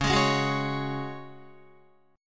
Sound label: C sharp Suspended 4th C sharp down Guitar; strummed; JGuitar